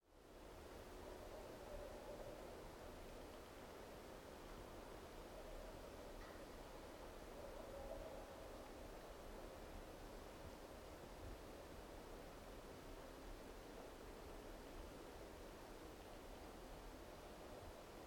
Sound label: Snowy Ambience